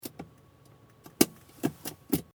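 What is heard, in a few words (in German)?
Auto Handbremse lösen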